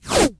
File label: Industry Tape Pull Off Roll Electrical